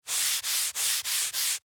Office Dry Erase Board Erase; Erasers (Sound Effects:Offices)

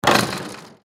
Basketball Shoot Miss Hit Rim Rattle; SPORTS; Blastwave

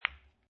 Billiard Pool Snooker Ball Hit By Other Ball Soft; Sports; Lynne Music

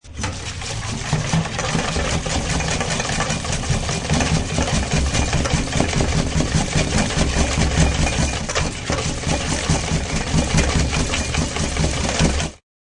Mixing whipped cream.; Food Prep; Restaurants

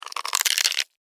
Insect, Movement, Crunch, Bug; Sound Effects:Animals:Insects; Blastwave

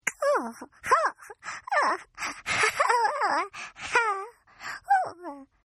Baby Laugh; Laughter; Partners In Rhyme

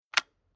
Rifle Long Bolt Action Sight Distance Adjust; Weapon; Austrian Early 20c; Rifle Sound Effects

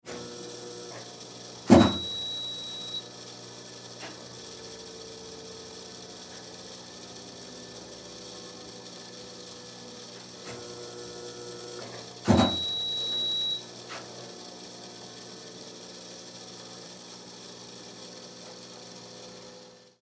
Emergency Blood Pressure Machine Run Beeps; Hospital

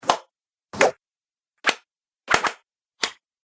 Punches With Swoosh; Punch And Hit Sound Effects

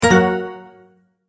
multimedia tone end

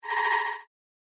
Magical Pulses, fantasy sound effect; Magic Sound Effects; Andrew Fleming

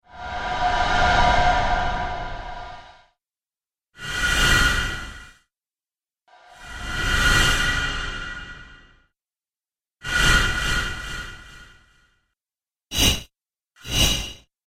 Industrial Whooshes; Whoosh Sound Effects; sound design

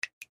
Drip Small, Water Drip Small; Dripping; Blastwave

